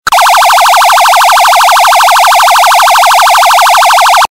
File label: Hyper Yelp, remote compact